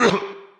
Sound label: spirit hurt; FlyForInsanity